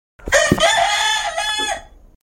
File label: rooster